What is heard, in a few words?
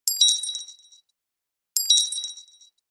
nokia coin